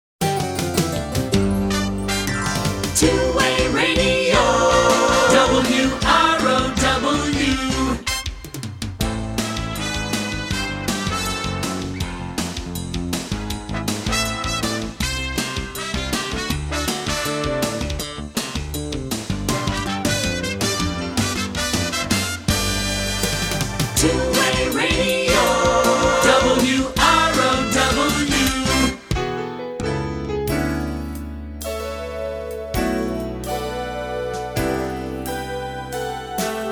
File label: Jingle; Short Attention Jingle Show